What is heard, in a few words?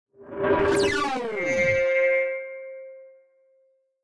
Laser Whoosh Front To Rear To Front; Laser Distortion Whoosh Rear To Front Movement Production Element Imaging Element Accent Transition